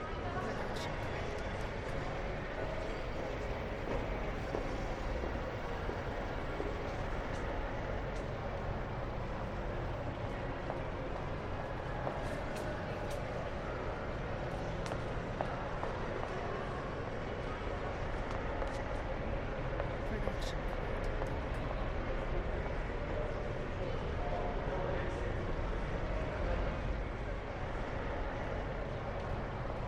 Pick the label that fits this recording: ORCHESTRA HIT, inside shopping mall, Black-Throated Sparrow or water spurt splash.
inside shopping mall